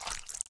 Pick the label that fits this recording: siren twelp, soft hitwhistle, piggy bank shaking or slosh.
slosh